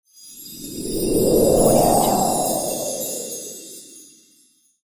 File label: magic chimes and wind; archisound